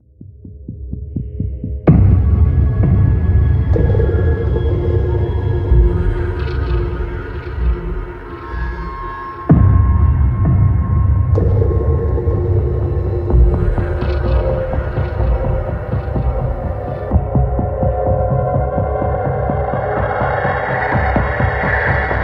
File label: Sneak